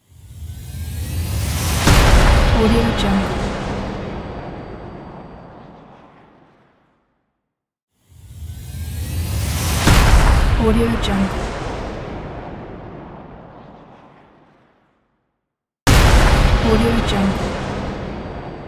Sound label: cinematic rise hit